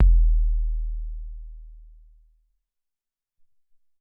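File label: Long Kick 4, Alesis SR-18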